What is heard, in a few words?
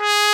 G#2, VARIOUS BRASS; AKAI S6000 CD-ROM - Volume 1; BrassWind